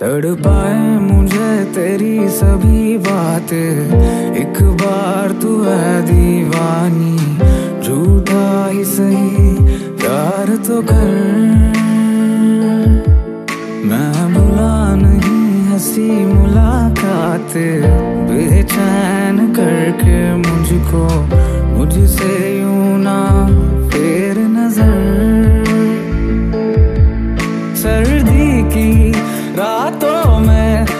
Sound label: Romantic Hindi Song Ringtone